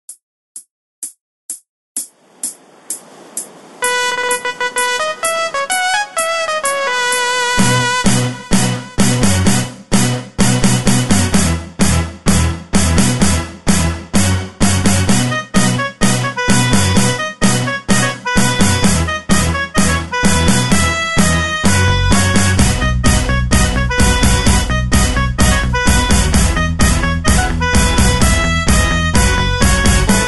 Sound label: ELECTRO; Stereo Mexico; midimusic